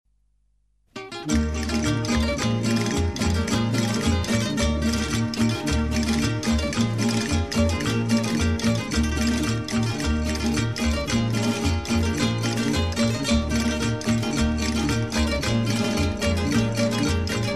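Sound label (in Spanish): guitarra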